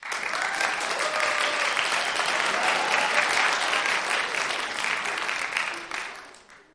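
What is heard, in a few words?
Applause Cheering Small Cr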